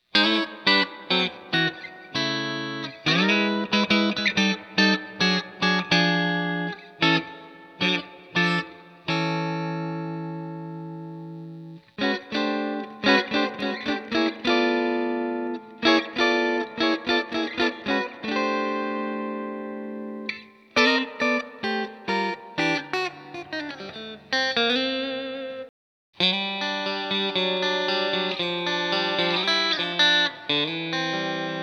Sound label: Guitar, Allen Encore Guitar Amp